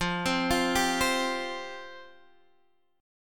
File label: F Suspended 2nd F Guitar Half Step Down